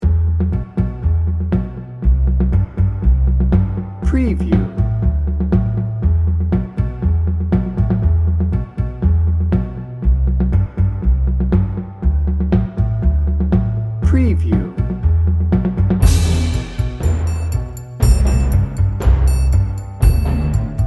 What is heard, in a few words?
spaghetti cowboy magestic orchestral